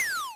pew; ReisenYoumuOuting

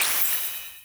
deflect hit; youmu; ReisenYoumuOuting

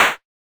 get hit; the-lost-memory

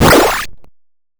scythe call; bfxr; ScarletBlackMarket; Chimata

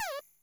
enemy Shot; ReisenYoumuOuting

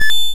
Shine; bfxr; PlayerSFX

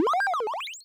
se recruit; eastern flames

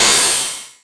old lazer; RyannSFX

